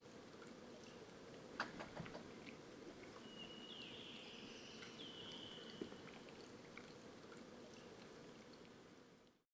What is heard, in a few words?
Turkish Fish Owl Bill Snapping Of Adult Probably Male